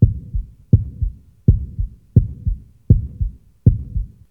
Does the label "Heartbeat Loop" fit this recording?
Yes